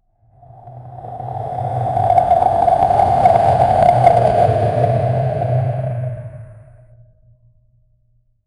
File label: a deafening roar shaking